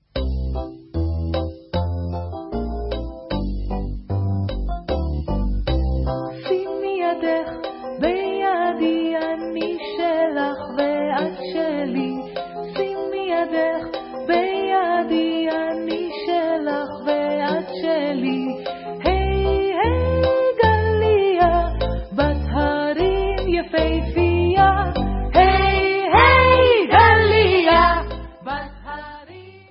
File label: Folk Vocal